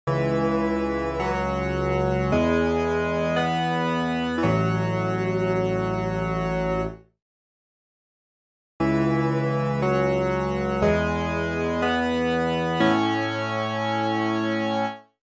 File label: Custom Wavetable